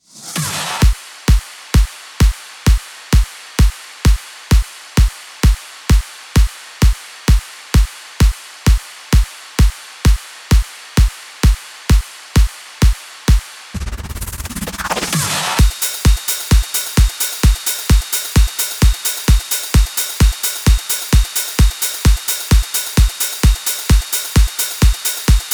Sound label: drums